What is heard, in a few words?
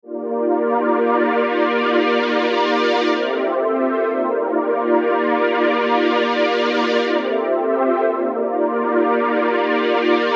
Pad Chorus 120 C; Dirty Little Pad; CE Chorus